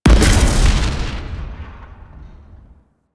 Sticky Bomb Proj Explosion; Grineer; Veilbreaker; Vor

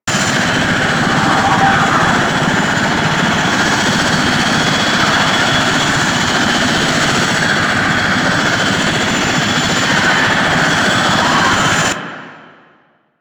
Movement Engine Loop; Warframe; Vehicles; Archwing Demolition

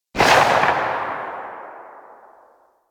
Sidearm Tail, Weapons; Warframe; Tenno; Wraith Sidearm

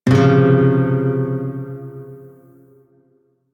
Shawzin Phrygian Chord D; Music; Warframe

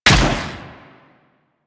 Vomit Gun Shot, Weapons, Infested; Warframe

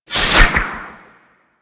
Light Glaive Throw; Warframe

